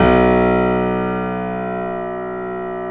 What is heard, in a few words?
GRAND PF